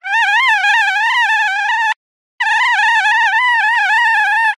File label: cartoon fly mosquito fly close by